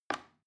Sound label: plastic button little release heavy